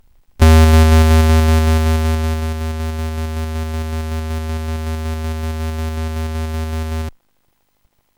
error beep; Sonix Music Driver

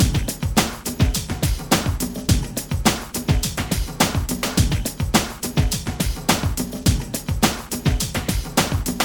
hifi drumbeat; Trackers Modland

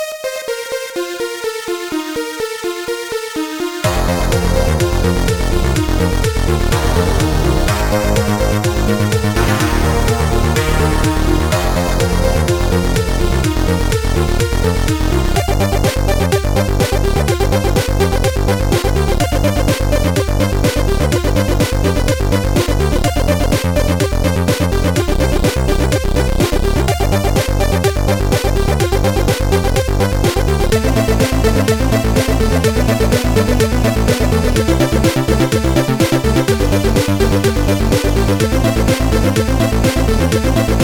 cute and bass

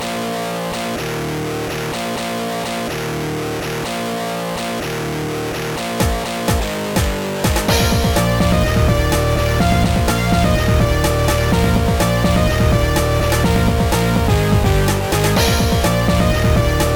heavy jazz; Trackers; Modland; bajla's heavy jazz

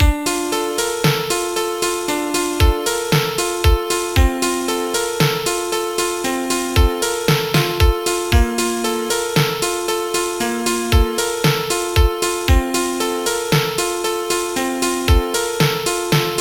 litle piano; Trackers; Modland

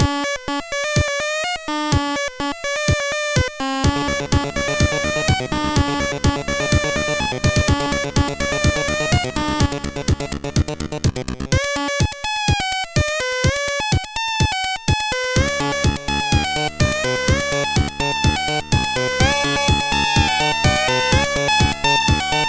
chip techno

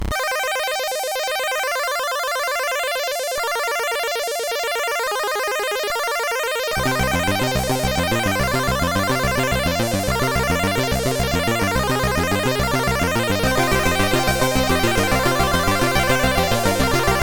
romantic chiptune

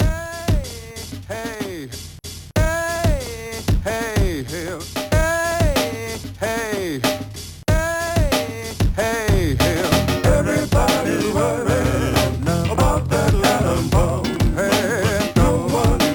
gospel karaoke; Trackers; Modland

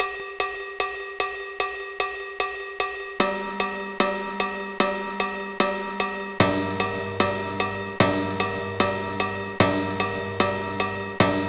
rythmic cowclock; Trackers; Modland

